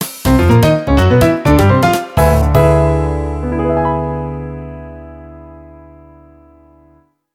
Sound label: Jingle; Gran Turismo 4